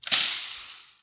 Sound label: attack, creature